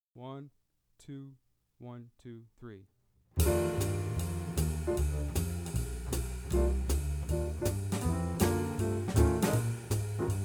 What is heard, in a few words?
Groove Blues (Play-Along), Saxophone; Jazz Conception For Saxophone 21 Solo Etudes (Eb Saxo)